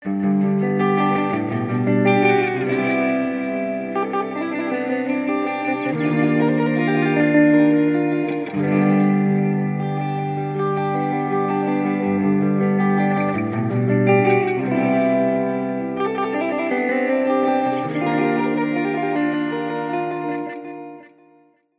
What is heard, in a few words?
tempo sync delay wet